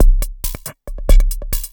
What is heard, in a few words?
percussion loops, Techno, 138